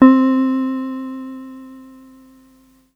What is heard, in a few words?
Yamaha PSS-50 Hawaiian Guitar C4; Guitar - Synth; Mono